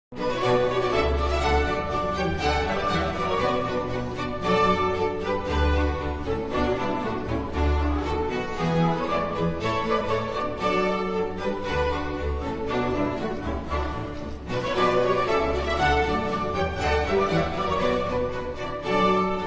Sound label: Sinfonia melodica in C Major Bourree; TWV 50 2 III.